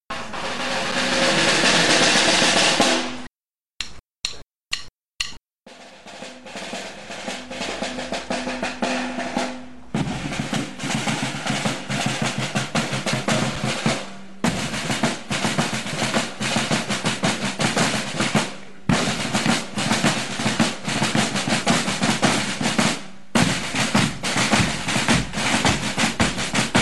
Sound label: Drumline; Prien